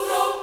choir

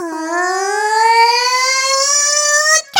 Vo Main

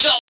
vox

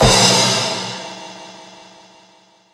Crash extended; 轻音少女

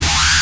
bass

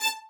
violin

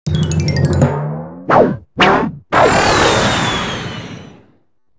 SE ENTRY TRANSITION; maimai

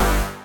synth

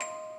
musicbox

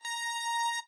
lead

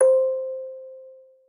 musicbox

